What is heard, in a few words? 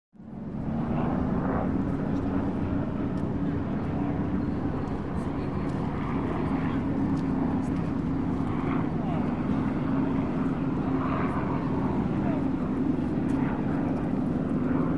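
Harbor Ambience Engine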